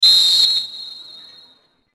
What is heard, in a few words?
whistle; csgo